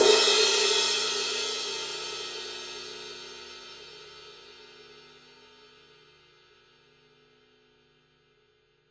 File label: 22 ride edge, paiste 2002